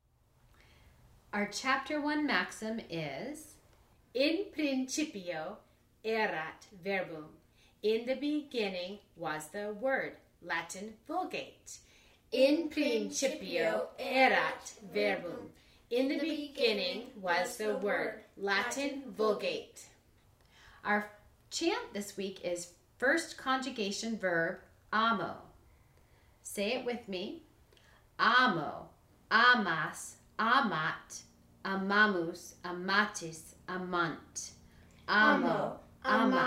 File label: chant